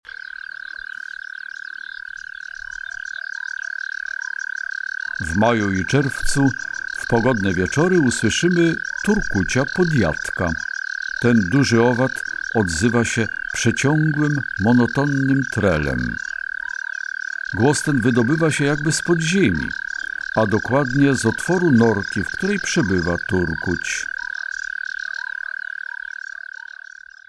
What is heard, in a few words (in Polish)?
Turkuc podjadek, Przyroda, Tajemnice puszczy; Kurs PTTK